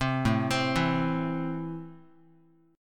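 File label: A Minor, Guitar, chord strum; 3,5,3,2,x,x; JGuitar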